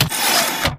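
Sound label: Stalker Movement Servo Head pivot Medium; Titanfall 2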